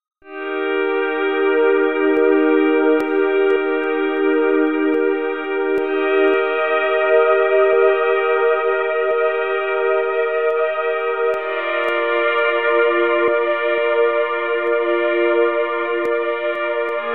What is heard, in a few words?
ambient; Ensemble